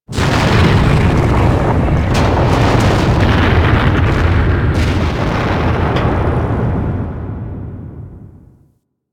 Star Base Large Rumble B; Warframe; Ambience; Star Base Intro

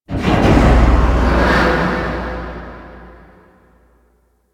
Cargo Elevator Distant Metal Groan; Warframe; Ambience; Guild

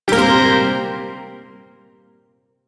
Shawzin Blues Chord; Warframe; Duviri Shawzin

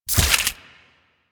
Arch Gun Primary Beam Impact Flesh, Weapons; Warframe; Archwing; Hildryn

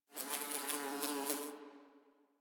Bee; Warframe; Ambience; Nineteen Ninety Nine

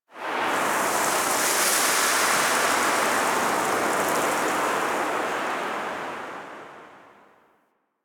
Sandy Wind; Ambience; Man In The Wall

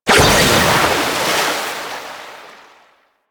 Aqueous Discs Release; Warframe; Yareli

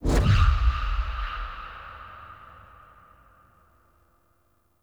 Health Critical, Warning Stingers; Player; Envoy

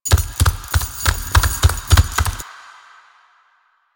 Walking Loop; Warframe; Kalymos

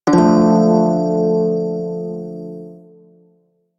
Prime Shawzin Maj Chord B; Music; Warframe

